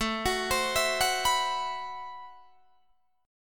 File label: A Minor 6th Add 9th, Guitar, Drop D